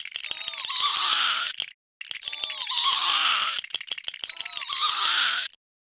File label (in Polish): szczur wychodzi